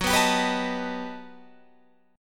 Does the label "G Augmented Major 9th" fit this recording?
Yes